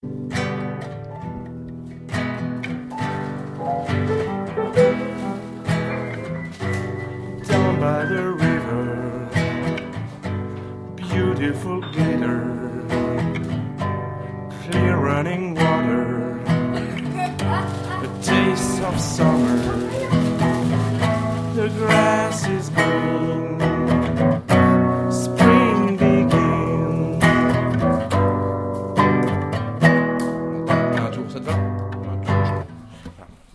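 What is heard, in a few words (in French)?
Guitare Voix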